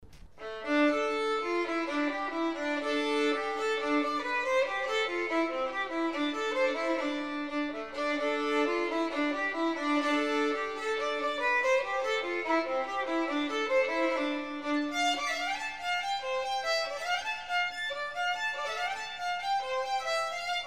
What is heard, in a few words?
Penny Wedding Reel, celtic wedding music - solo violin